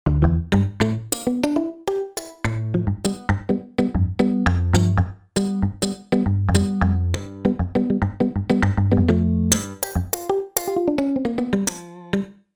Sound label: Funk Gtr; Factory Patches